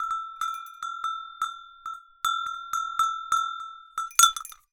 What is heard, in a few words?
Cowbell Chain, Percussion